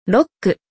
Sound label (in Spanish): jabali; Relaciona Palabras en Ingles